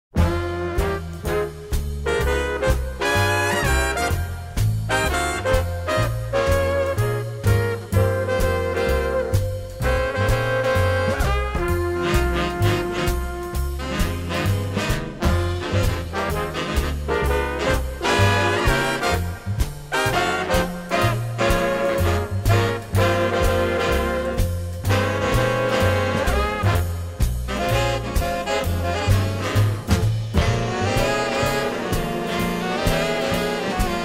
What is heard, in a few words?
big band; Маленькие антологии популярных мелодий 30-х годов